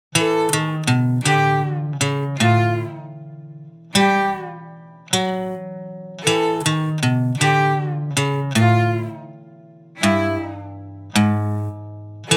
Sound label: dirge; MESA GHOST; Albuquerque, New Mexico